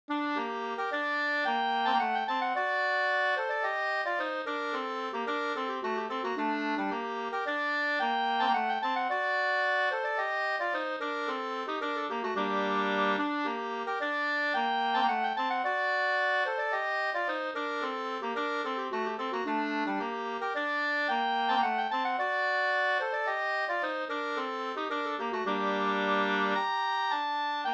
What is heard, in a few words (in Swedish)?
polska Senpolska